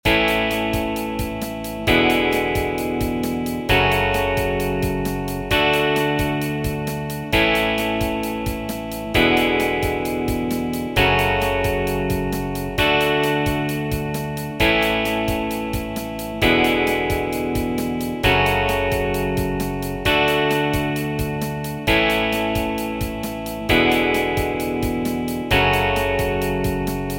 Tryout track Fast Rock Full; 2027 MMEA conference All-State Collective Ensemble audition

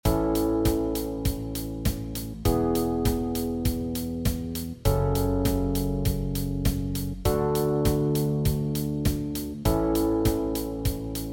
Country No Guitar; Tryout track; 2027 MMEA conference All-State Collective Ensemble audition

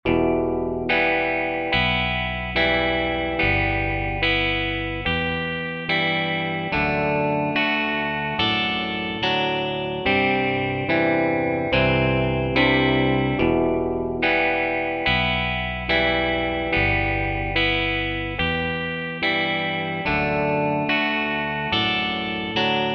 Folk No Drums, Tryout track; 2027 MMEA conference All-State Collective Ensemble audition

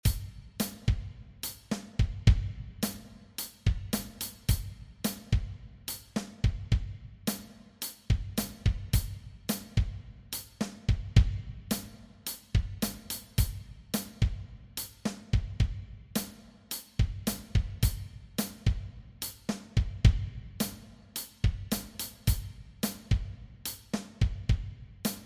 Tryout track Drumset; 2025 MMEA All-State Collective

